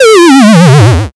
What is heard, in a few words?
powerdown